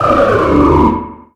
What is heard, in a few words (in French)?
Cri Banc